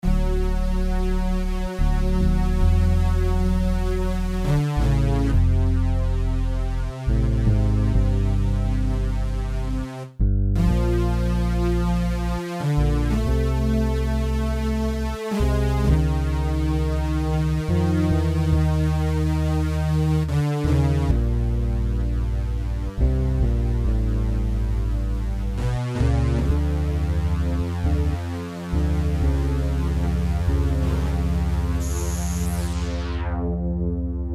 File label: Ballad